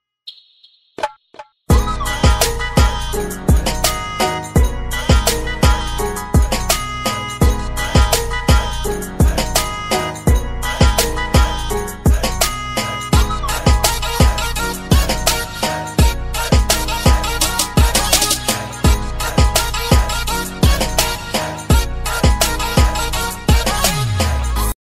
viral beat ringtone; tiktok

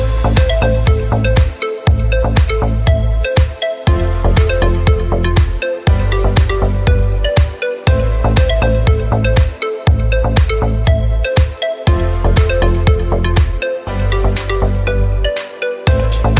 cool deep ringtone

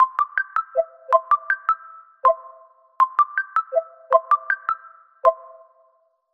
sikkim ringtone

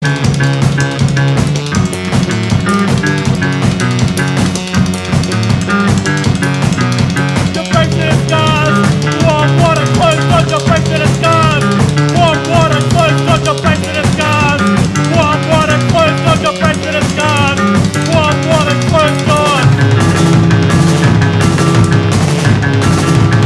belgium tune ringtone

